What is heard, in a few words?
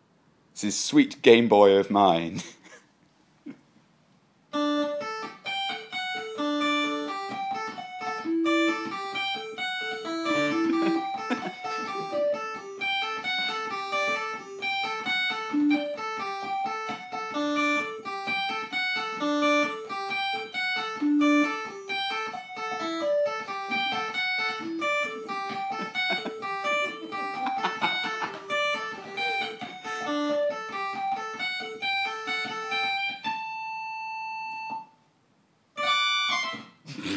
cello guitarboy